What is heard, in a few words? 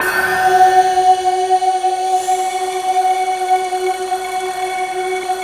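F#4, INDIAN BAMBOO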